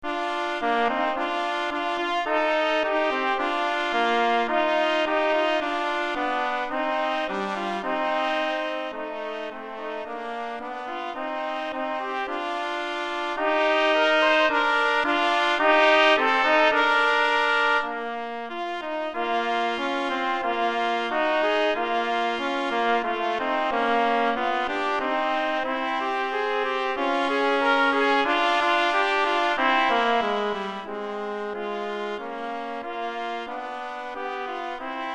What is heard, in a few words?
Trumpet Duet